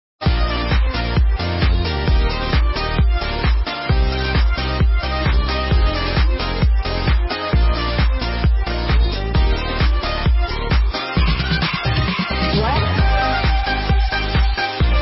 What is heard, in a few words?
fancy bg music